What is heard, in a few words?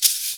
SHAKER; Univers Sons; Basicussions